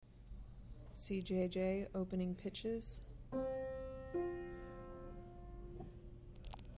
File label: opening pitches